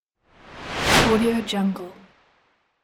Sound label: swoosh sound